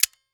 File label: Industry Vice Grips Close